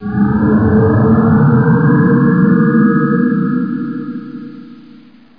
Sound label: mission sound power on